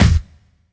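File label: snare drum bass drum, Dance Mega Drums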